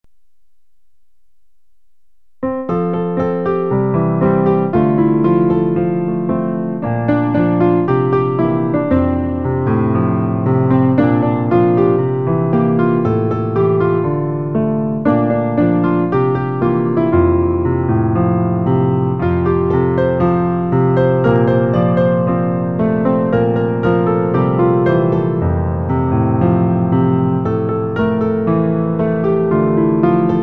piano

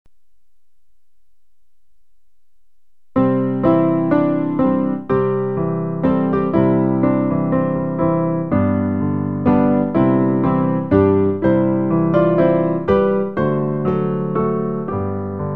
piano; recueil Louez Dieu

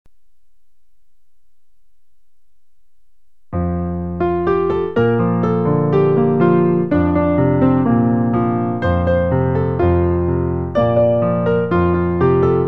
piano

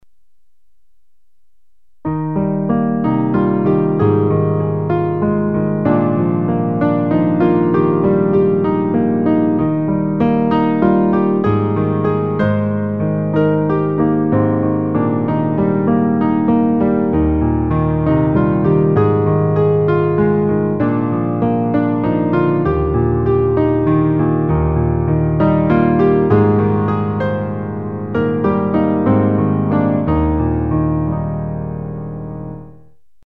piano

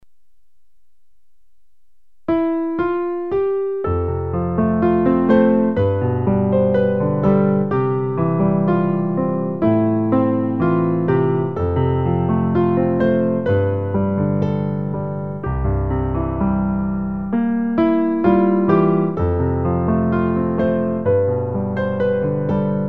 piano; recueil Louez Dieu

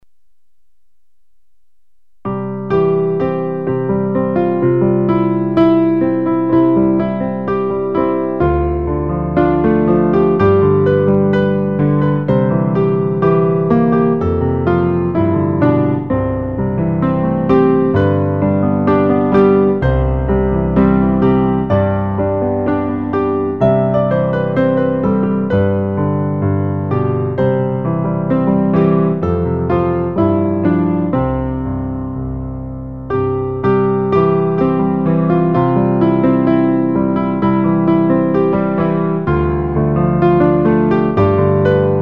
debout jeunesse piano; recueil Louez Dieu

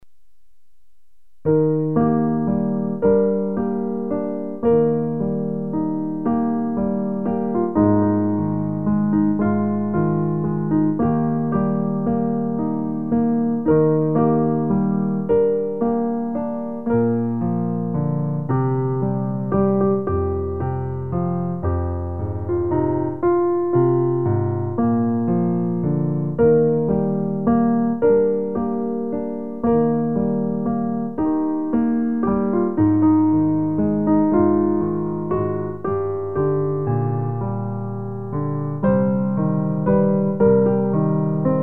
piano

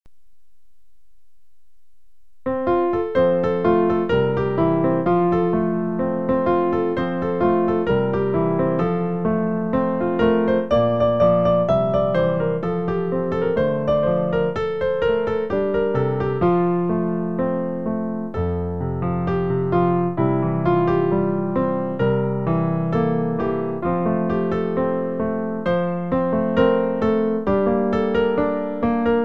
piano

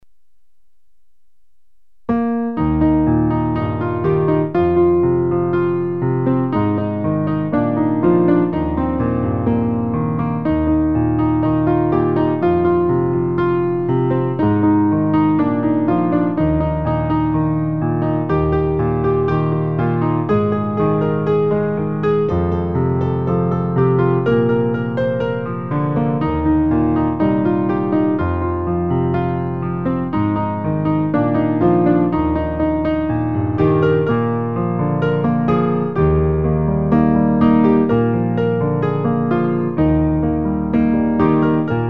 c'est si beau la vie piano; recueil Louez Dieu

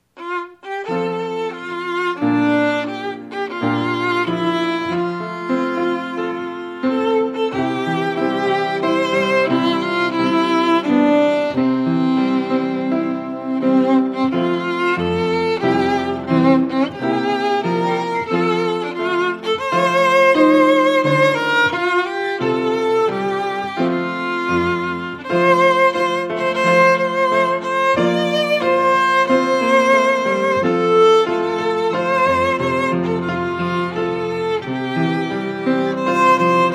violon

